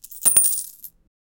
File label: ding ding sound of coins; money